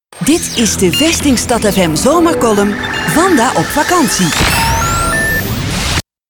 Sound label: Shout; Zomercolumn